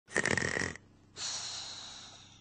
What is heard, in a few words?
snoree; rblx